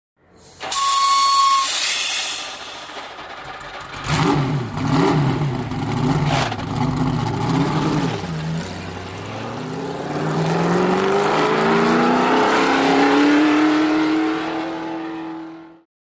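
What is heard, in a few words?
Sports Car Start Away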